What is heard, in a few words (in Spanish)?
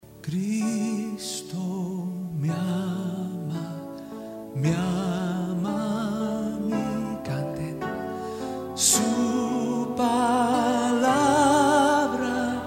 Cristo me ama melodia palabras